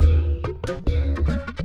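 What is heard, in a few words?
Music Dpad 145 Maxisphere; From Dust; PS3; Xbox 360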